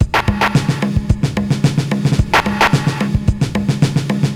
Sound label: 80s Gated Verb Korg MR-16 (110BPM), cyborg beats